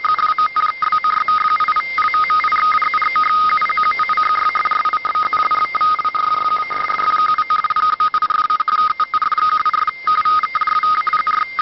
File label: rtty